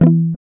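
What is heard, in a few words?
GUI E2, acoustic guitar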